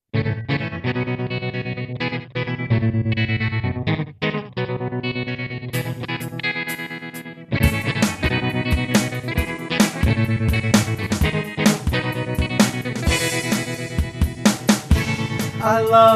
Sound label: Tremolo